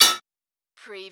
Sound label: Button Sound - Metal Med Anvil